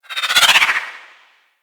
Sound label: Arch Wing Dodge Roll Right; Vehicles; Movement